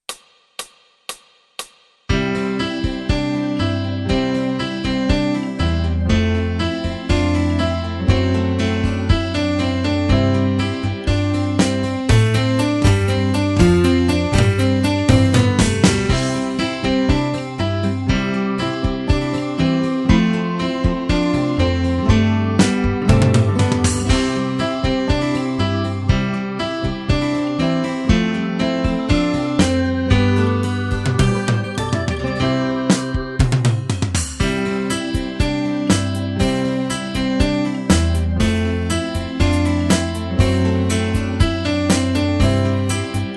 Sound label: Piano